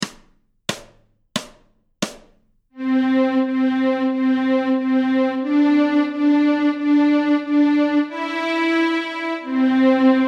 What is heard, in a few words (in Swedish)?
Minilåtar Stråk C, Fiol